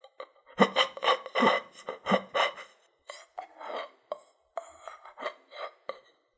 poisoned man sound suffocated man sound effect, dying man